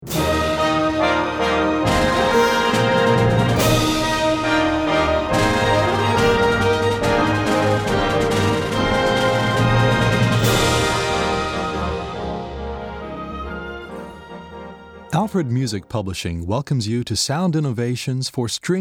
Opening and Tuning

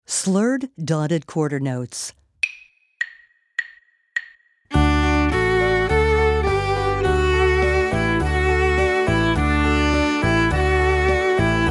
Slurred Dotted Quarter

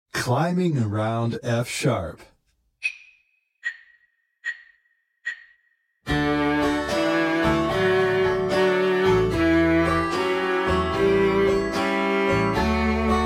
Climbing Around F Sharp

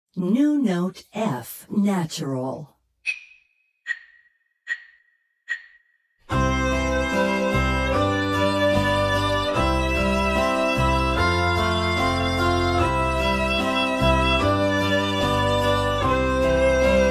New Note F Natural

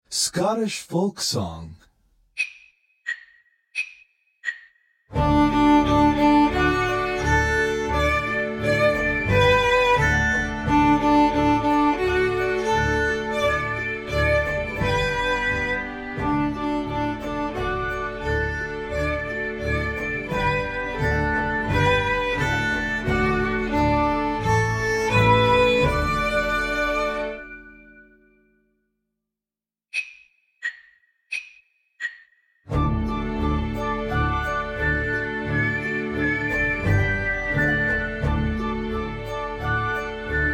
Scottish Folk Song

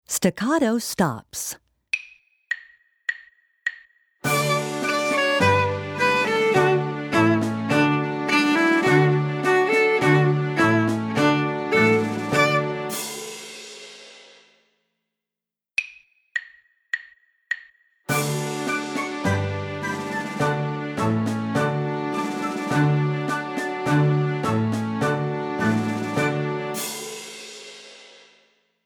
Staccato Stops

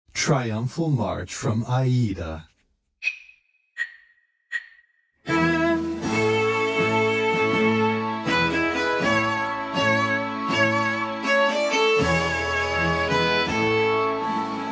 Triumphal March

